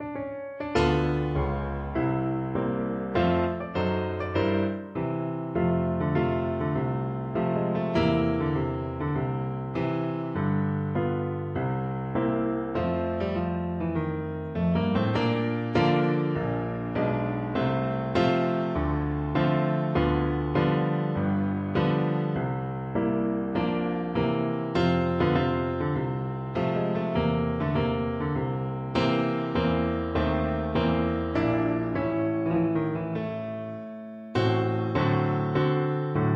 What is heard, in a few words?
tuba